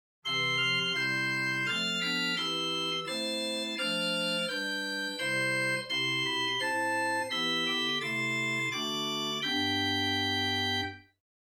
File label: Gedackt Oktave, chamber organ